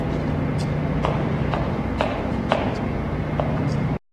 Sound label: crossfoot; Gymnastics; 2 step; rope jump